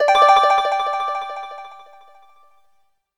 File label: System Accent